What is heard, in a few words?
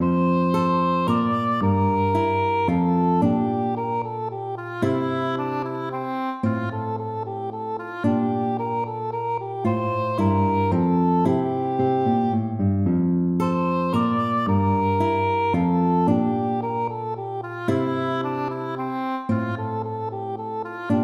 minuet major